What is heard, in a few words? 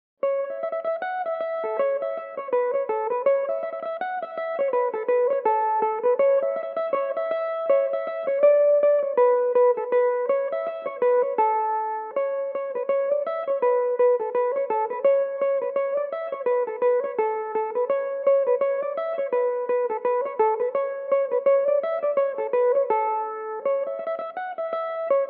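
A major, Irish mandolin; Gan Ainm 3; Belmuse EM-190 electric mandolin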